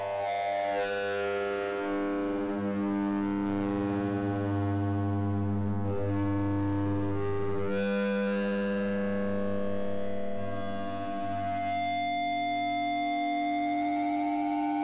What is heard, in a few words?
Alien Electronica